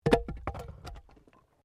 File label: Wood Log Fall; Material components for constructing more complex sounds; Materials